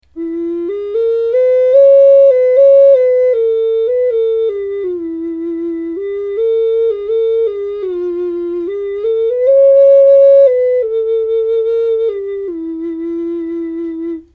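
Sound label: ocarina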